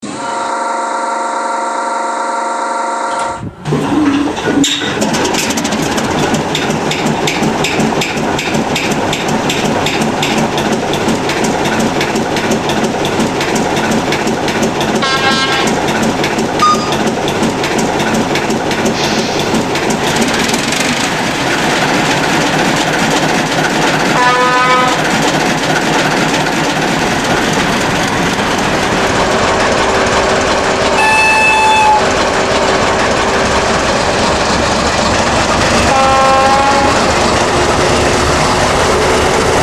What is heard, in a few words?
CHME2